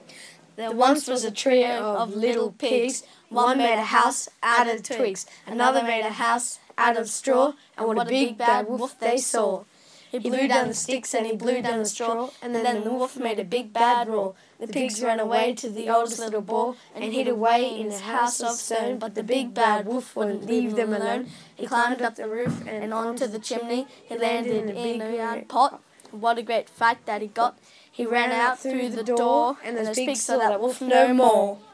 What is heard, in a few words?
3 little pigs version 2 rap